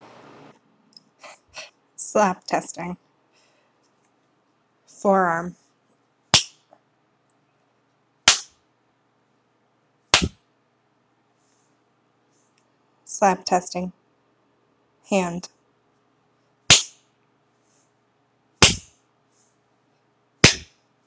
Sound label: Slap test dry